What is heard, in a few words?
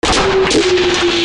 WEAPONS